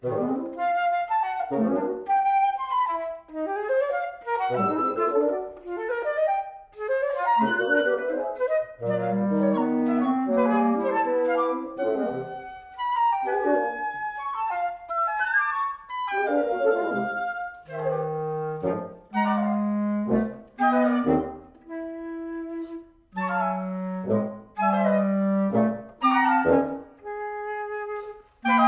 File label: wind quintet allegro